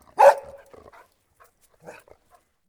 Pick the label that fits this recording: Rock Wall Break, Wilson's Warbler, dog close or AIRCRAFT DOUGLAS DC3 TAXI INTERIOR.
dog close